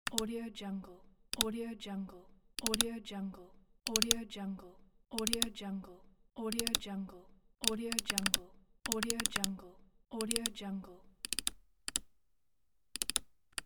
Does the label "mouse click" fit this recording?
Yes